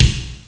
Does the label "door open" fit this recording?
No